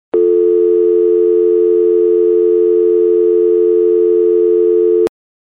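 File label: sound of telephone dial tone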